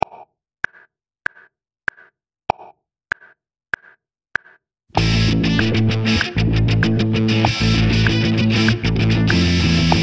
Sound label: Improv 8 Bar Funk Rhythm Based